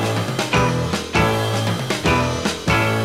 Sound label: beat